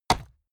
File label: horse step stone